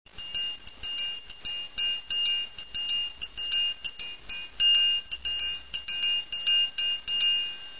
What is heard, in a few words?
triangle; So indeterminat